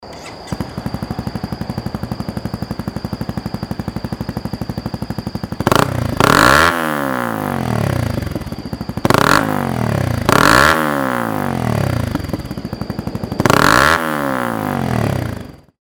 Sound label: crf250rally